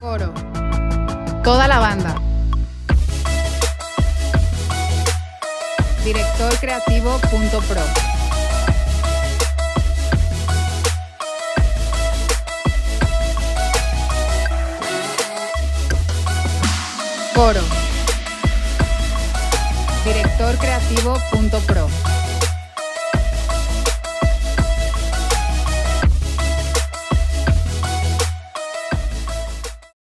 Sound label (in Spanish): Future Bass, Remix, E, 83, 4/4